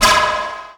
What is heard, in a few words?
Forcefield Ripple Impact; Energy & Forcefields